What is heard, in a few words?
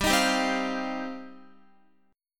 G 7th Suspended 4th Sharp 5th, Guitar